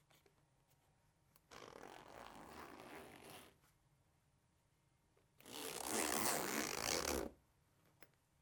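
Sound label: zipper fastener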